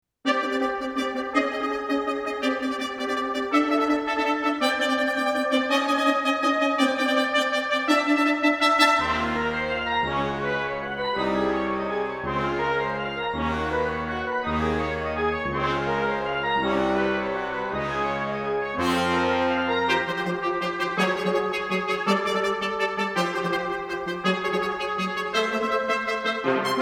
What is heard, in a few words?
Brass Fanfare